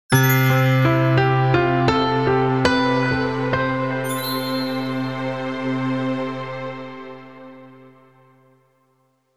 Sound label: sweet ascending piano stinger